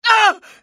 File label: pain; chekov